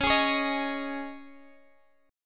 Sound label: Ab Suspended 4th Db